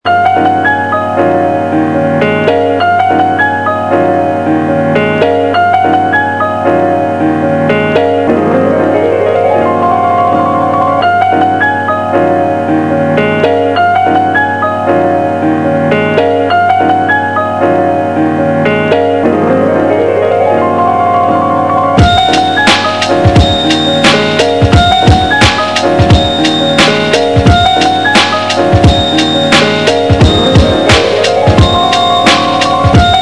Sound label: piano